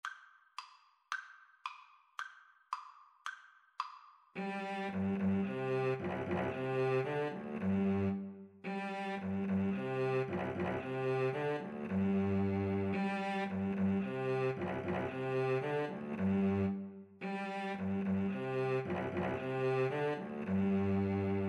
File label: violin cello duet; La Rose